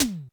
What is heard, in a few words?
Yamaha RM 50 Toms